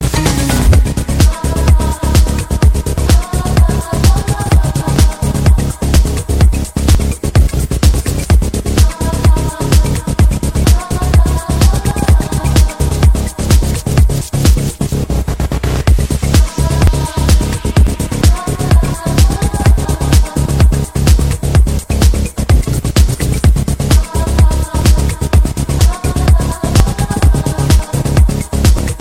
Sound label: D J Remix